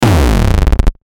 Descend Chopper Distortion; Descending Sound Effects; Blastwave

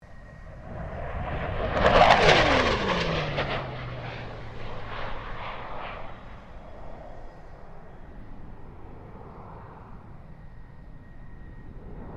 FA-18 Hornet pass; RAAF FA-18 Hornet; Airplanes (Sound Effects:Vehicles)

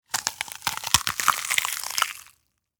Horror Carcass Flesh Rip Tear; Injury Sound Effects; Injuries (Sound Effects:Combat)

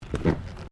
Footsteps Stairs Wooden Carpeted Step; Crocs; Footstep Foley; Human

